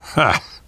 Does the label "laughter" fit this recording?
Yes